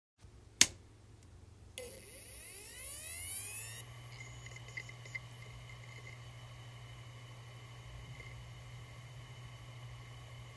hdd spinup

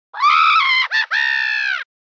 aaaaaaaaaaahhhhhhh

